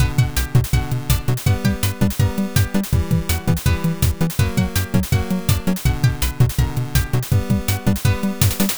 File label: bgm